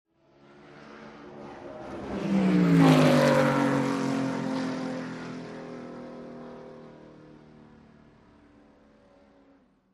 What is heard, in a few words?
Sports Car By Turn